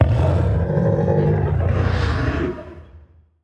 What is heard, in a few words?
death; Barotrauma; Charybdisold